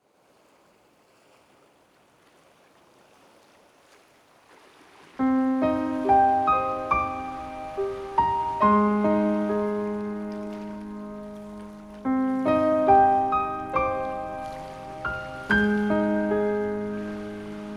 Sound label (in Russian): Rising Sun Bolshe chem muzyka Zvuki prirody Fortepiano